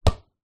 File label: sport soccer ball bounce hard ground